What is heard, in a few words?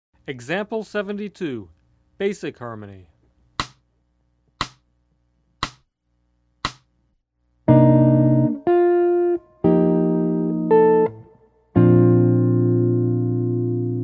Jazz